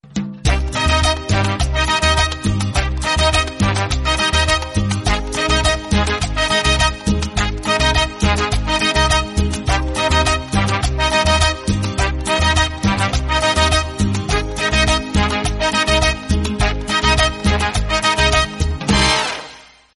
salsa

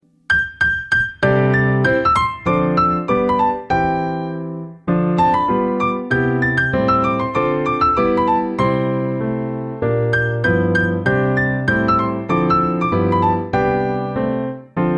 ringtone

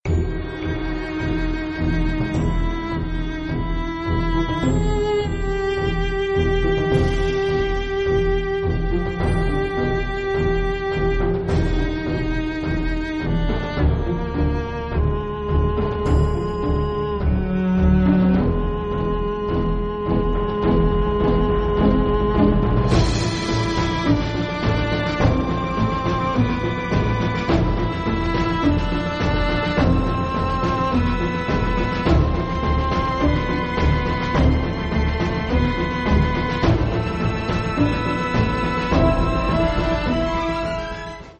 romantic

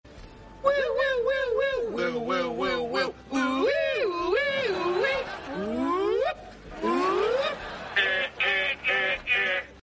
car alarm; himym

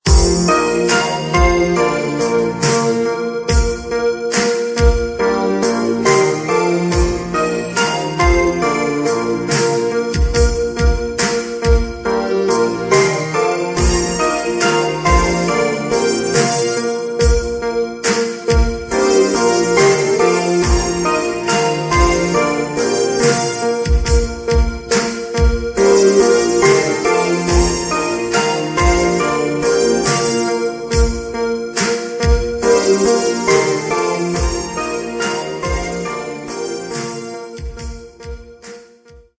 romantic

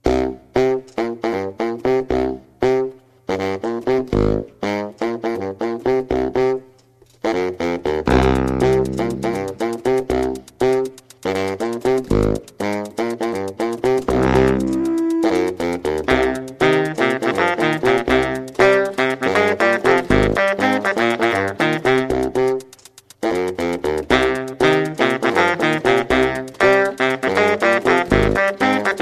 saxophone

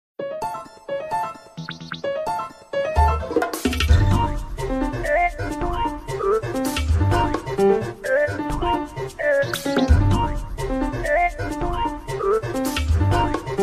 battle theme; shrine

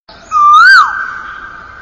koyal

